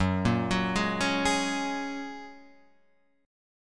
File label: Gb Major Add 9th, Gbadd9 chord; arpeggiated; JGuitar